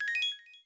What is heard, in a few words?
reserved item; nsmb